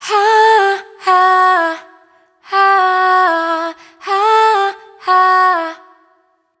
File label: riff Oh Hah 120 Em wet, Vocal; Slate Digital Omnivox Vocal Sample Pack